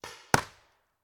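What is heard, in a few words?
Paintball Gun Impact; Sound Ideas; Guns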